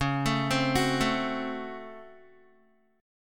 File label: Db Major 7th Suspended 4th Sharp 5th Db Guitar; DbM7sus4#5 arpeggiated; JGuitar